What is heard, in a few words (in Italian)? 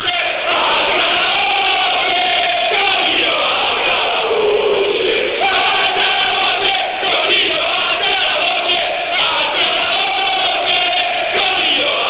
coro